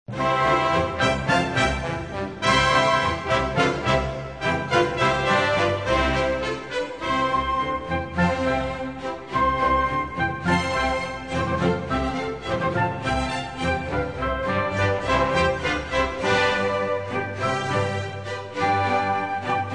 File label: Majesty Strings; Hallelujah!; Christmas